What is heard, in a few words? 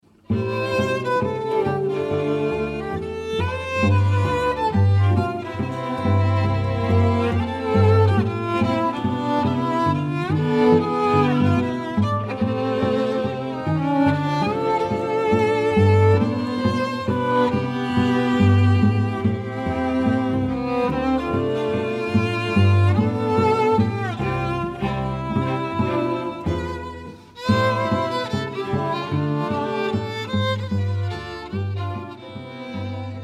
strings